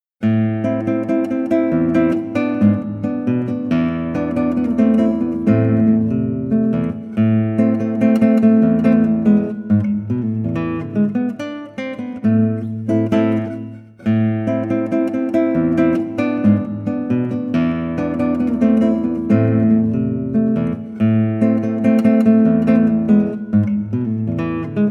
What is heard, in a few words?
solo guitar, rumba